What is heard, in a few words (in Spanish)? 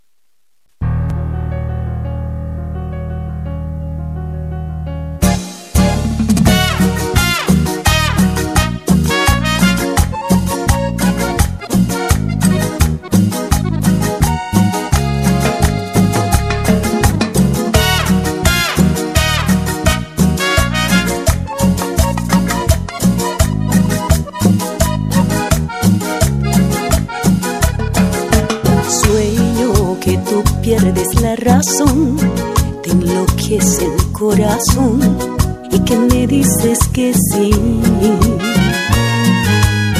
Cumbia